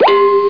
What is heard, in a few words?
kick swish bong; amircsounds